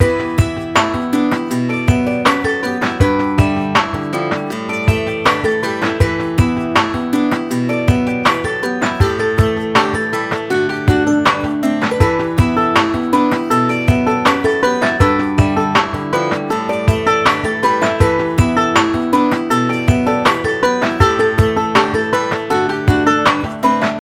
Irish, phonetones ringtones; LEAGOO; M9

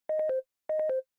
Low Battery In Call; LG SU370 Optimus One

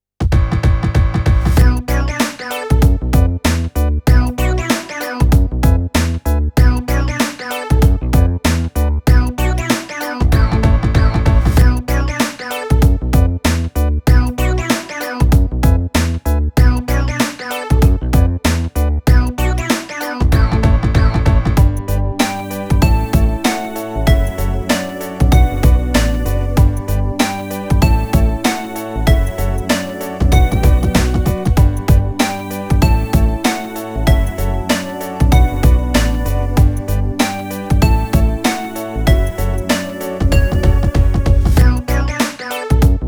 Tween Bounce; SE Cool Tween Bounce; Sony Ericsson; PlusFourSix

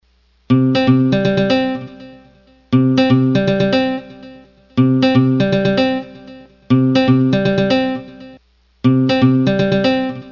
Strummin; Sonim XP8 ATT; ringtones

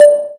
Video Record; LG G Vista VS880

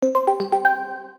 drop, notifications; ZTE nubia Z20

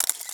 camera shutter

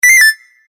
message alert, Sony Ericsson; Z555i

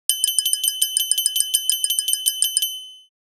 Timer, phonetones Nokia N8

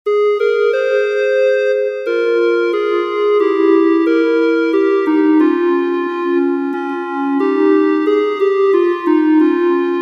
Liturgy; World; Russia

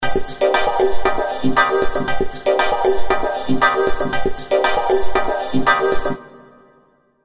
Shocking, ringtones; HTC Wildfire S

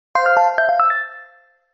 message alert; Sony Ericsson; K600i

